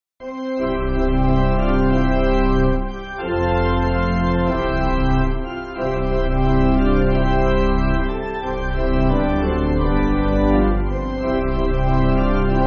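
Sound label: Pipe Organ, Backing Files for Churches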